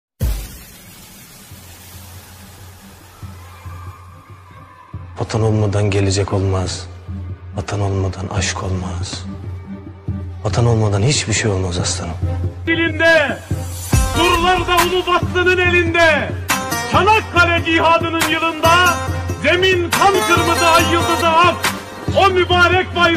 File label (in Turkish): sirenli turkish soldier trap remix